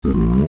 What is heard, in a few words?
Talk; Bigocto; zelda; OOT